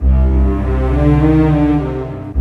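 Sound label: 100, orchestra arpeggi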